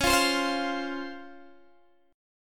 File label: C sharp Major 7th C sharp Guitar DADGAD